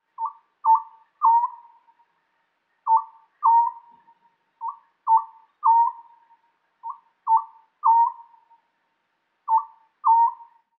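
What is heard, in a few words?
Oriental scops owl song, birds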